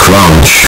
crunch earrape

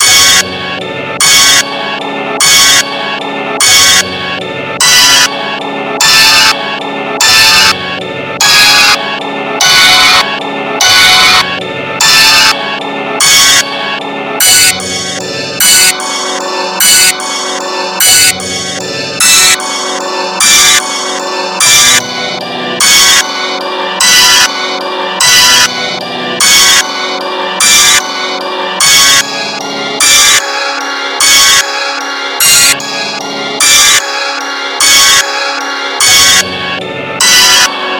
piano; Jeux Interdits

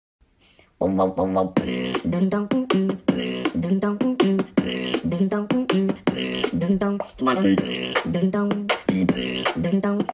throat bass

